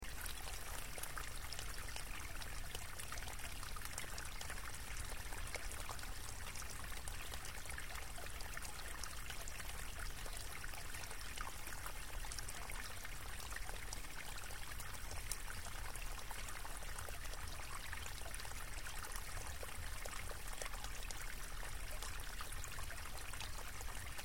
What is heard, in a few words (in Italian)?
fonte; besenello; masi alti valdigola; Alta Vallagarina; suoni ambientali; Portobeseno